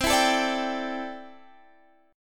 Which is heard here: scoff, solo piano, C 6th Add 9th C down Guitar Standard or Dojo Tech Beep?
C 6th Add 9th C down Guitar Standard